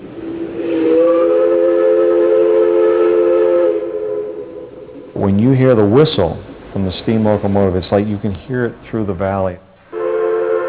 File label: steam